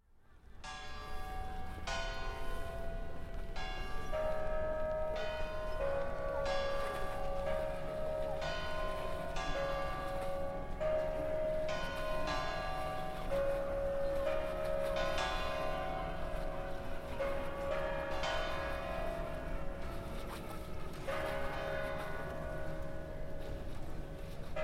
High Tide in St. Mark's Square, Bells and Chorus of Seagulls